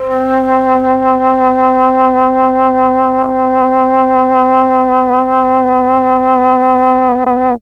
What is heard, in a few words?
flute; ILIO; Propeller Island Legendary M400